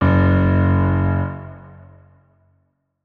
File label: notes, keyboard piano; Hideout Furniture